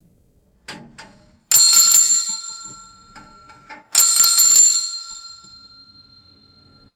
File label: muted metallic gas pump bell rings; bells